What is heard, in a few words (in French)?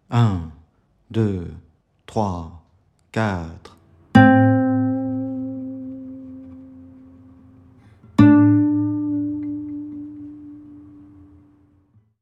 exercice vibrato classique 060bpm